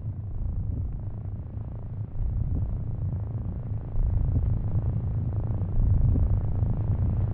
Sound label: spider; limbo